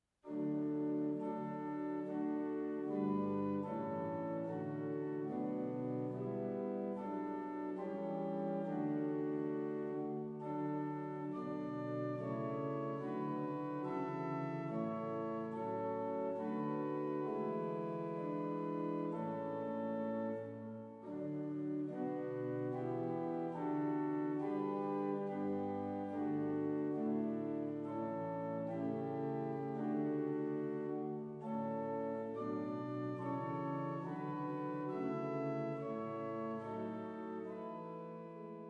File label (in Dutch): Hinsz-orgel klankvoorbeeld; Appingedam